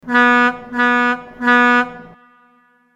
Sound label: 3 short blasts